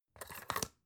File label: folder clamp flip